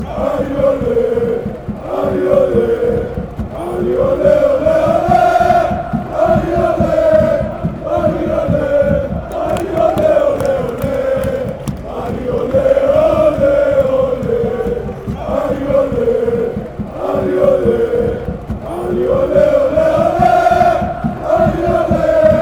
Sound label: ole ole ole, chants